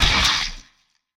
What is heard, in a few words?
Fight Death; Game rip; MiSide